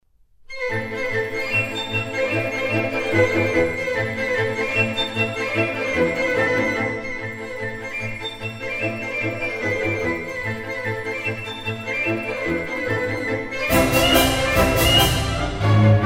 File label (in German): Chinesergalopp